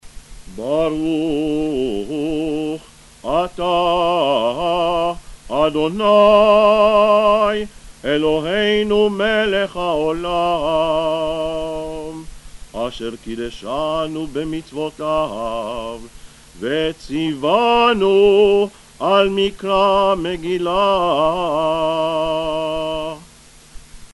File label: blessing before megillat esther chanted